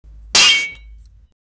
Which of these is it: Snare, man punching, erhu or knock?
man punching